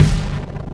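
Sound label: Distorted Bass Drum; Zero-G Jungle Warfare 1, 2 & 3; 90 s Sample CDs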